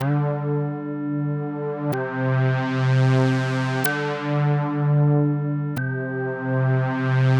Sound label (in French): BASSE